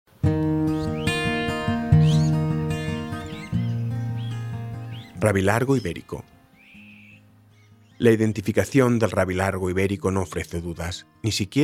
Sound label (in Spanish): rabilargo; aves; lavanderas